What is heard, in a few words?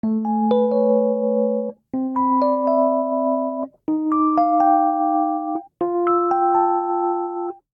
Progression Rising Major Uptempo I - bIII - bV - bVI A Major 124 bpm, Electric Keyboards; Ableton; Factory Packs